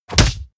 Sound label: stylized fist punch